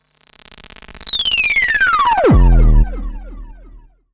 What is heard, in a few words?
Virus Drop; transition